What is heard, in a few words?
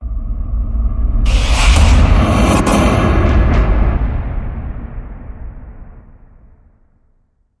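Hit Sttr, stutter hit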